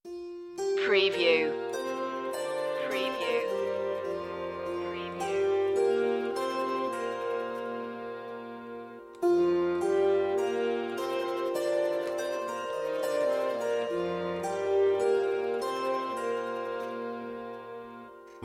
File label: barn raising loop